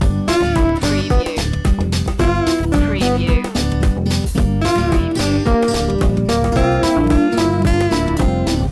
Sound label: electro strum loop